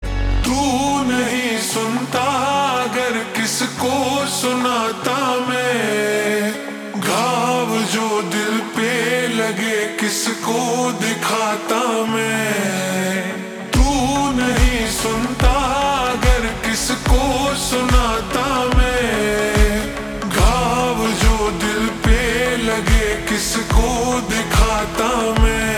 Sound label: Slow Reverb Version Ringtone